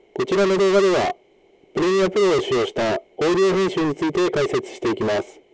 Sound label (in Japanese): ギタースイートA級トラック適用